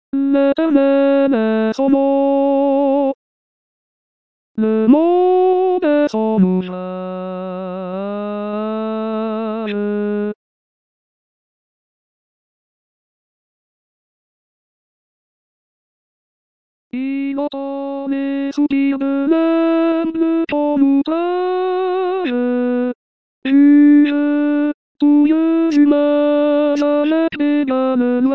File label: Tenor; Liszt; L'Eternel Est Son Nom